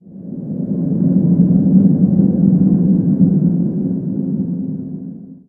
dark wind; ambient; rnd outdoor

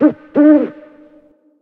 owl, outdoor ambient

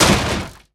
wood big hard

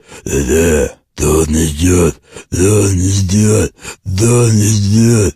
zombie idle

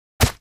bullet hit

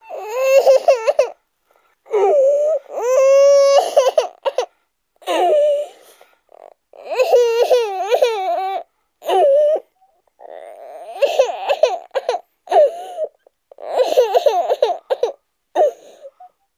crying; ambient

